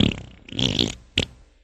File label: fart short THREE BURSTS